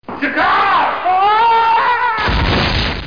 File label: ahhh; Babylon 5 Interactive; gkar